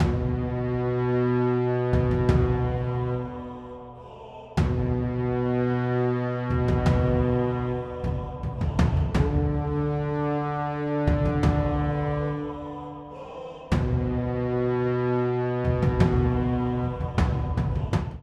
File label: Game Over Theme; Pompeii The Legacy